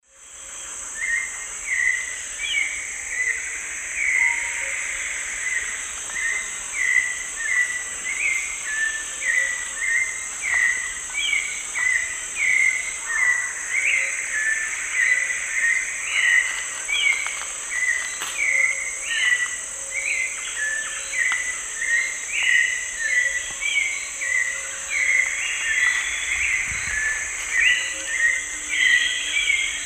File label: Turdus hauxwelli, song; S. America; Eastern Lowlands